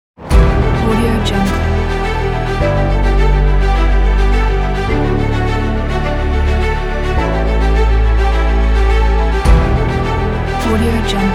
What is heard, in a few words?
the epic dramatic; uragrand2007